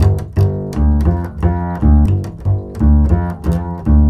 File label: CHASE G, Bass; Spectrasonics; Bass Legends